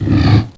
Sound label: Behemoth Hurt Sound; Heroes3